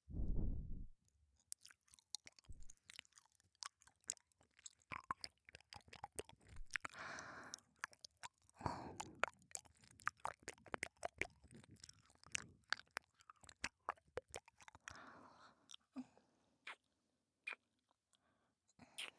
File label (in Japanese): 耳なめ吐息 BGV only, ASMR